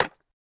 树脂砖放置声

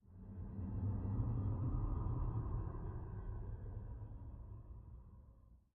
下界荒地低沉声; ambient

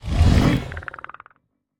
监守者愤怒聆听声

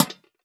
灯笼放置声

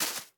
樱花树叶破坏声

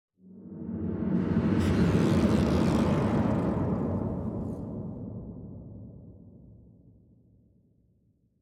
绯红森林氛围声; ambient

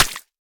泥巴 破坏声

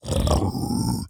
猪灵蛮兵待机声

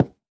樱花木 破坏声

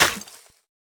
可疑的沙砾破坏声

